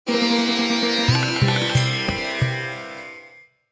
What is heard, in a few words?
India Music Short; Civilization Revolution